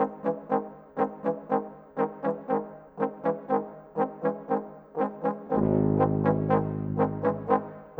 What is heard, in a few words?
Trombones Tuba, Orchestral; Sonic Foundry (Sony Creative Software); Orchestral VOL-2; Modern 11